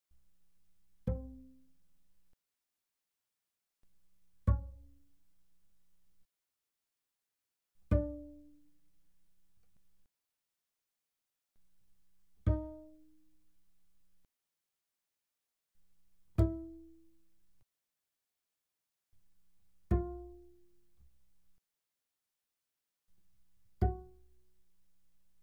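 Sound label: Bass pizzicato fortissimo sul G C4 G4, doublebass; Strings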